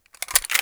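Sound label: weapon foley p90 reload lift; Call of Duty Modern Warfare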